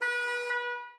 Amb Red Corridor Car Alarm Loop

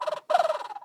Woodwind-Pigeon

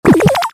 UI Heart

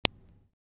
Crystal; The Henry Stickmin Collection

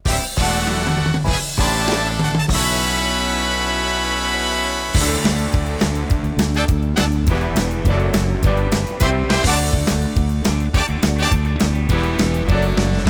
Gameover Music; Wheel of Fortune

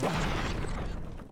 Drill Dig Explosion; enemies

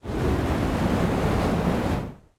Fire totem projectile

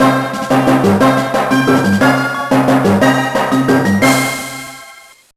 Fanfare Get Tool

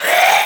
Moon God Attack; unsighted; enemies

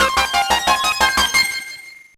Fanfare Game Save

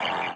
boss hit Voice

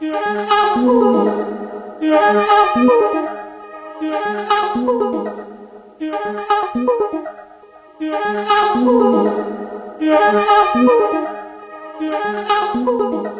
Music Loop

